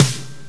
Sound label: snare drum; gmplay; drums